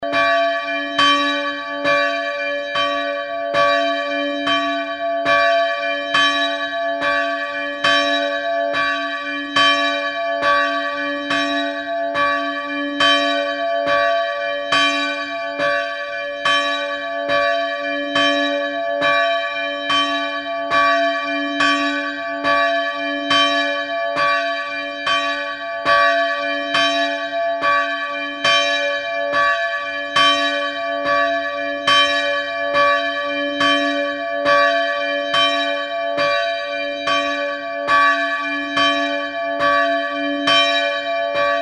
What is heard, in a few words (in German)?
Glocke